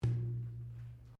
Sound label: Wooden Column Impact Fist; Material components for constructing more complex sounds